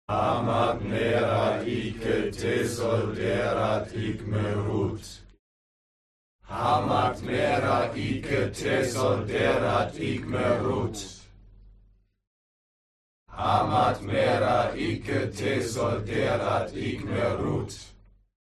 HUMAN CROWD Evoke Normal; smartsound

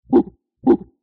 Gorilla

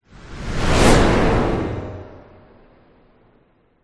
whoosh; orlando lanzini

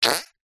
cartoon fart short squeak

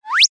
cartoon slip trip high pitched fast

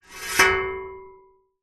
metal scrape blade ceiling fan; foley

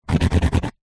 foley cardboard scratch sharp object

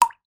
water drip; sound effects one

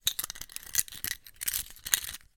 household keyring plastic movements; zapsplat

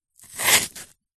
foley long hair cut with scissors single cut; zapsplat

